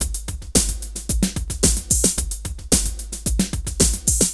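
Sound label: drum loops, left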